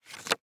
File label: spiral notepad close